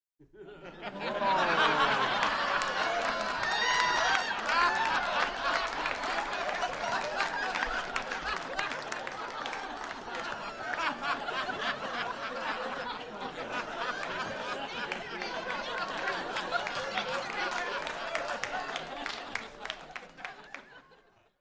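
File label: Crowd 50 Laughs Sparse; small int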